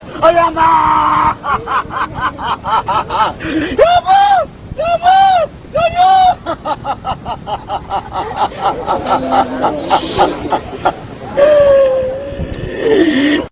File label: wail